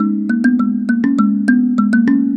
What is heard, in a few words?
Mbira Low; Melodic; Ambient-Melodics-II